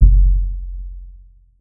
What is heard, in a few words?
Kick Trunk